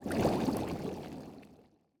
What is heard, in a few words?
Bubbles